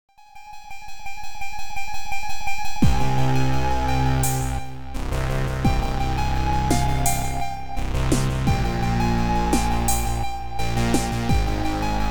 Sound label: win